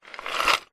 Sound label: household jewelry in wooden box tip slide lid closed; zapsplat